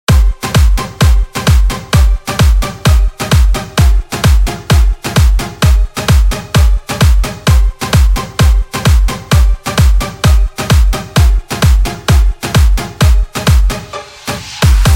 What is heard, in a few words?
Music Ringtone